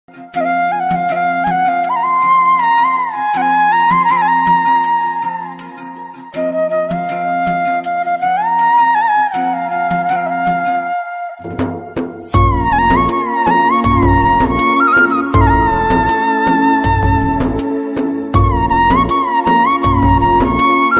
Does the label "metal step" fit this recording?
No